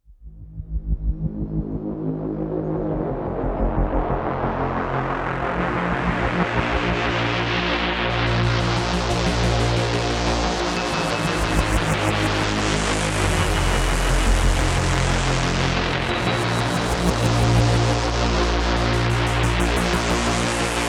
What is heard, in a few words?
ReMix; Donkey Kong Country 2; OC ReMix